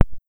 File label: Single Glitch